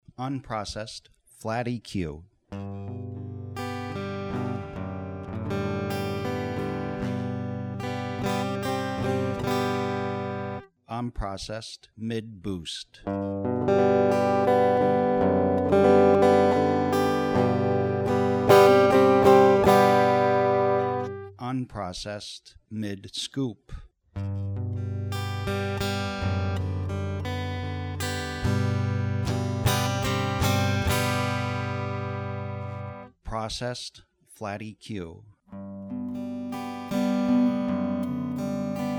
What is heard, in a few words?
acoustic